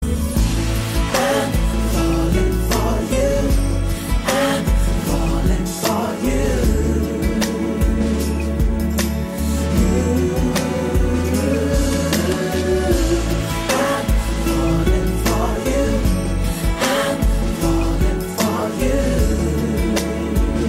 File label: karaoke hook